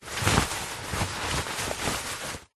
foley clothes shorts swimming boardies short handling movement